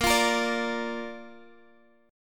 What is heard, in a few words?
Bb Major, Guitar; strummed; 18,20,20,19,x,x; 4E,4A,5D,5G,5C,6F